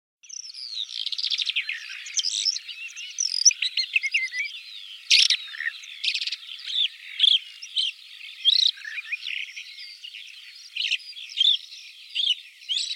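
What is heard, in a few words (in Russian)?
chernyy zhavoronok golos, black lark voice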